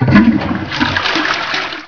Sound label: flush